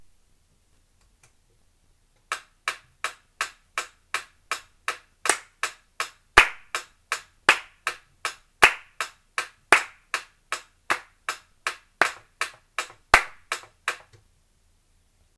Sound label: rhythm